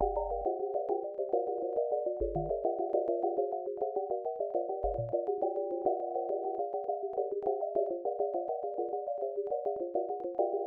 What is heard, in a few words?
high synth speedy